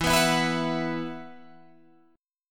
E Suspended 2nd E, Guitar, Drop D; 14,14,14,16,17,14

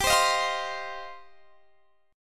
G 7th Suspended 4th Sharp 5th, Guitar DADGAD

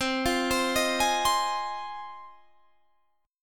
Ab 6th C

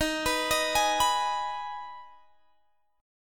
Eb Suspended 4th Sharp 5th, Guitar, Open G

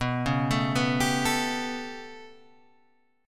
B 7th Suspended 2nd Sharp 5th down, chord

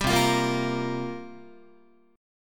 C 7th Suspended 4th, Guitar, chord

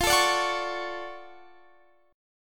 D-Major 7th F

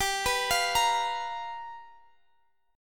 G 7th Sharp 9th G; arpeggiated; x,22,21,22,23,x

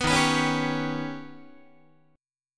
Eb Augmented 7th Eb down Guitar; Eb+7 strummed; 14,11,14,11,11,12; JGuitar

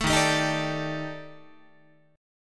D sharp Major 7th Flat 5th, down, Guitar; strummed; JGuitar

